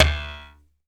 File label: RAIL BUZ; Rarefaction; Poke in The Ear With A Sharp Stick